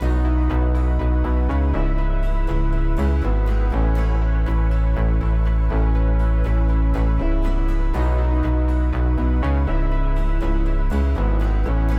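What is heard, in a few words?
121 BPM C# Minor, Melodies, Pop; Arena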